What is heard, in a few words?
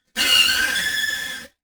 Transition Metallic Phaser; Production Element